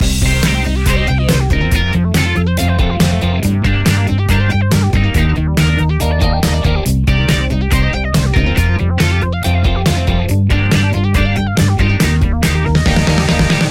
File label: full city loop